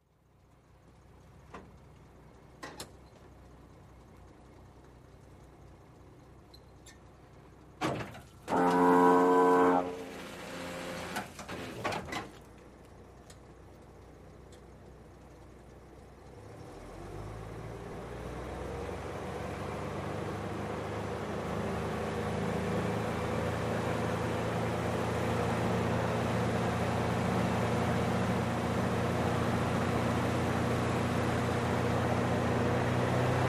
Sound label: Fire Truck Water Pump